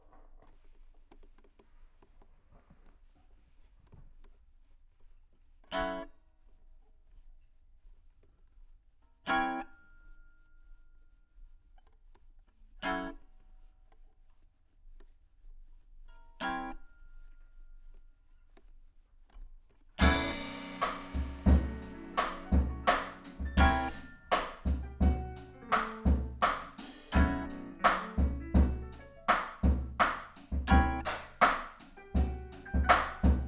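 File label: guitar Mic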